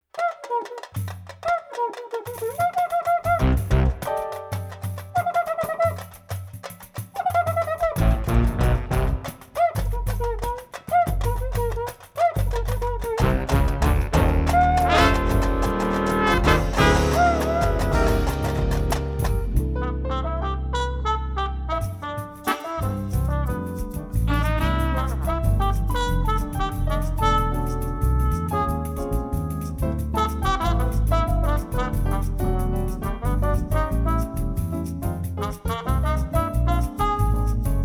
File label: Brazilian bridge